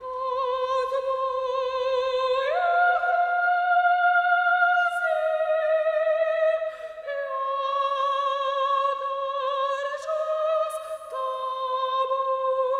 Summer Action Chor; Freedom Fighters

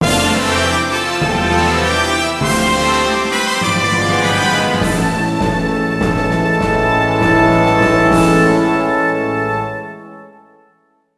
Gameover; Hajime no Ippo: The Fighting! DS; Nintendo DS

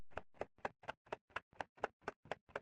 Wheel-Reverse Loop

